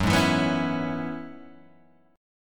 Gb 7th Suspended 2nd Suspended 4th Gb down Guitar Open G